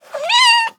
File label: Kitten Meow; SES Animals SFX